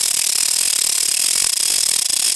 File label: GRIND, CARTOON